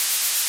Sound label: Spray; mother2